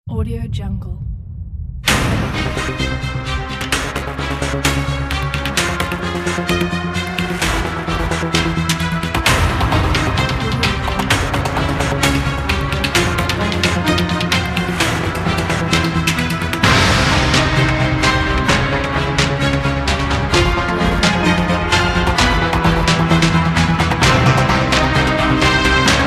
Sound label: electronic cinematic; musicgoddess